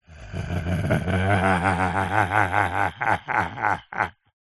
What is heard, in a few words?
human male middle aged laugh growl sinister